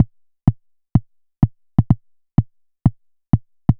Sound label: Anabeat Kick